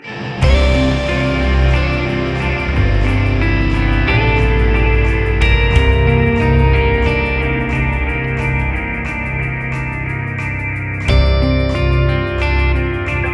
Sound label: Dark Jangly delayed guitar all instruments; CUES for film TV games etc